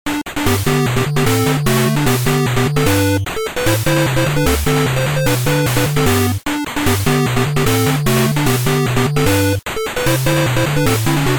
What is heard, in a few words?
BGM Menu; Soccerdie Cosmic Cup